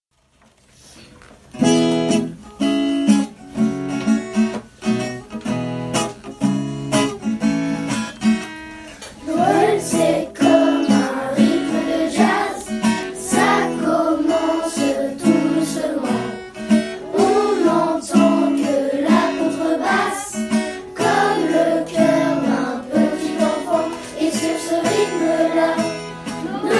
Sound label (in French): Noel jazz